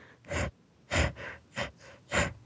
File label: heavy breathing